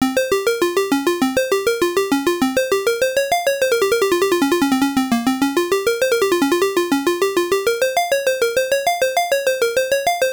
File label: Level BGM; Ninja Princess; sg-1000